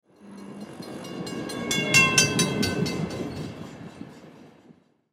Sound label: Train Amtrak, Train Ride Exterior Crossroad Barrier Pass By Bell Dings; Transportation; Train Sound Effects; Blastwave